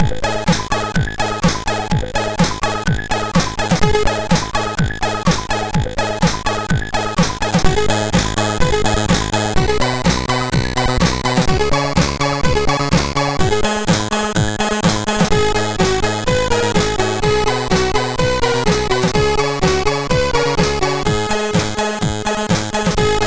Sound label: Sex Song; Signor